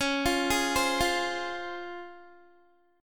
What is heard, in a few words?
C sharp Major 7th C sharp Guitar; C#M7; arpeggiated